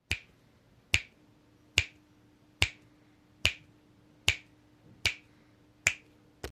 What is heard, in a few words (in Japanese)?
パッチン